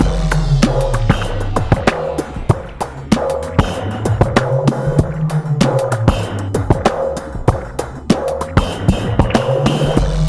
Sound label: 96.3 bpm, Breaks; POTBreaks